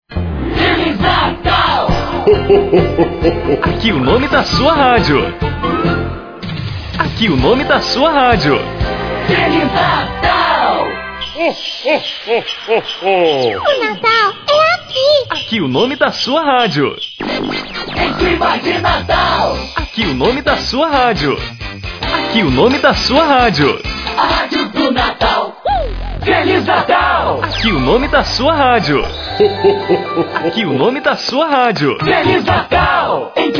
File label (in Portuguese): vinhetas natal